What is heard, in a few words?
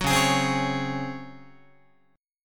C sharp Major 7th Suspended 4th C sharp Guitar; C#M7sus4; strummed